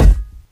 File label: physics cube